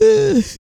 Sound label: PERC, MALE PERC